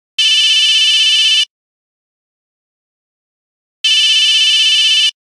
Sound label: Low, Nokia Buzzer; Windows Phone apps